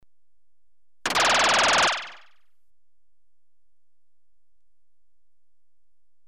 Machine gun rhythm (rapid, short bursts), Electronically Generated Sounds; Sound Effects Library